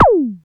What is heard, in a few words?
Click, Roland System-100; Drum Samples